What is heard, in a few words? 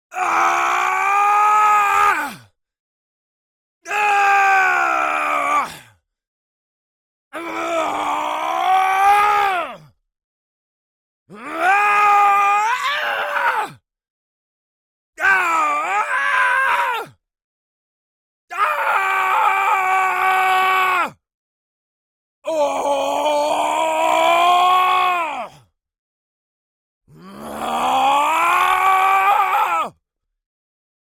Male Grunt Battle Cry Long; The Grunts Library; Ehrlund M1